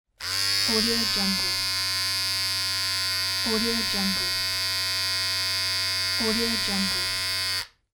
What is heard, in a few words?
trimmer